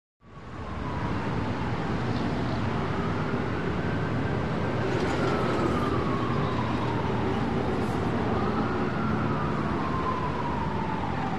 Distant Wailer Siren By In Highway Traffic Roar. Ambulance Or Fire truck With Honks And Siren Variety, Echoed.